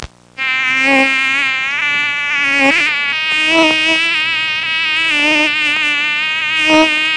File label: Mosquito long buzzing